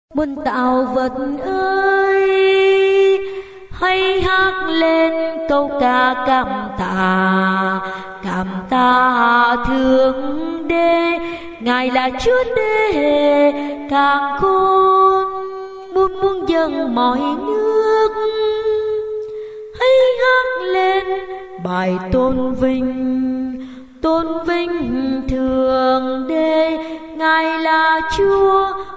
basso

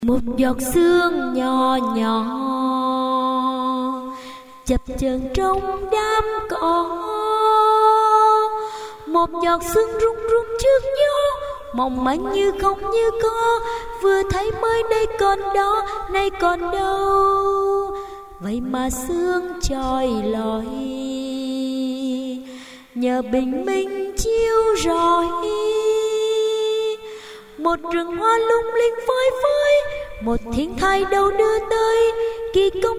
sop alto

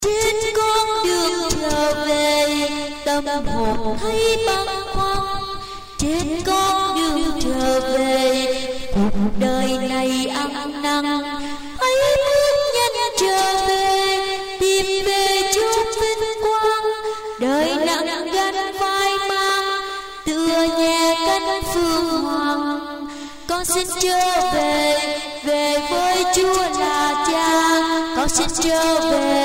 sop alto

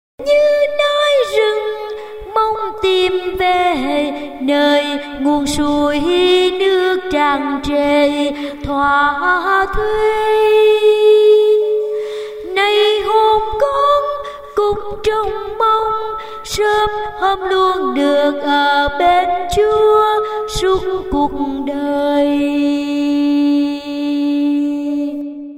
tenor

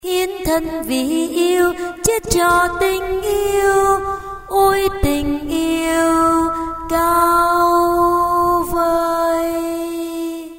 alto

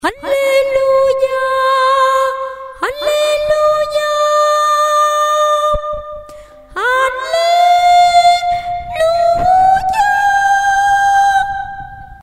tenor

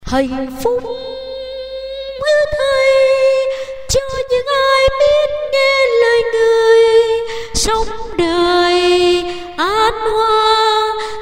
tenor

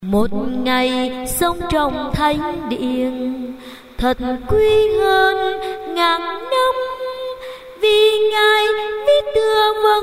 sop alto

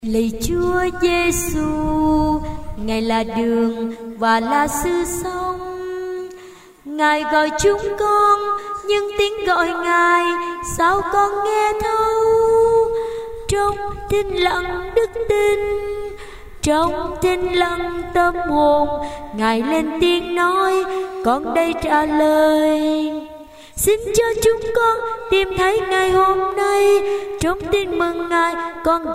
tenor basso

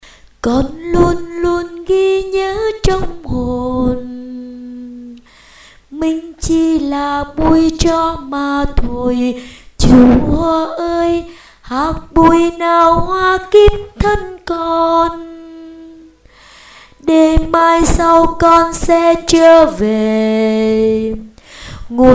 tenor

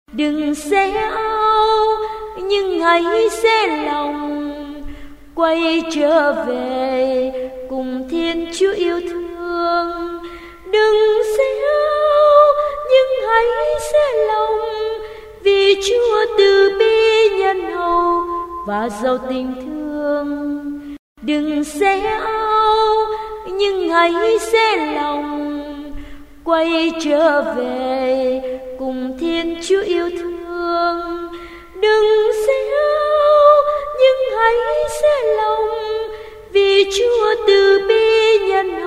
tenor

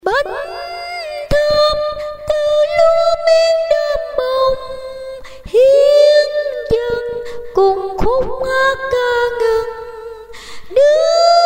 tenor

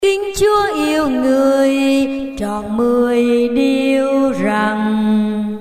basso

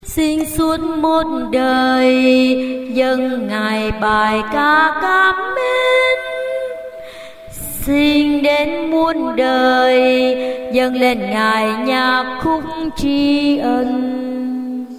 alto basso

